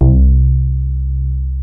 HOUSE BASS; Kid Nepro - Elite

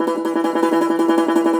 Cumbus Saz; Plucked Strings; E-MU Producer Series Vol. 7 – Old World Instruments

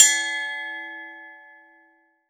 SPOKE HHO, SPOKE HI HAT

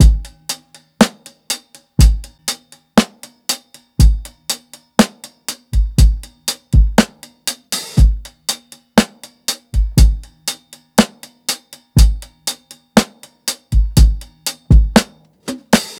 120 HALF TIME, drums; LOOPS; Basement drums vol 2

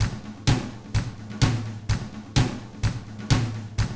127 Drums

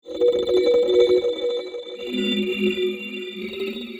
Discordant Loop (120BPM); cinematic drama; Loops; musicradar

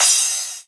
Crash, Cymbals

